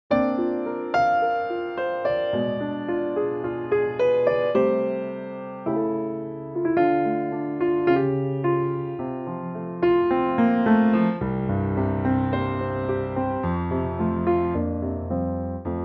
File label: F piano